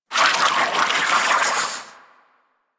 Landscape Puffer Plants; ambience; props; one offs; Warframe; Venus Open World

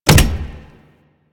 Step Default; Warframe; Corpus; Arachnoid Hunger; Steps

